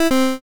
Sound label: cant buy